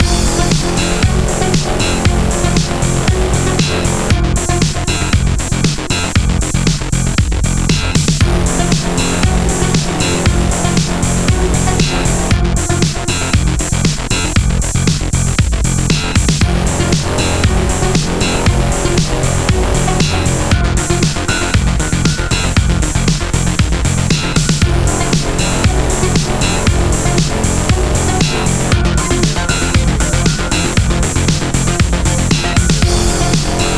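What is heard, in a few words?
Speed loop midtempo; Duel Maze; Boucle Mitempo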